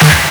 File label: Snare; Takebeat; Club; VEC1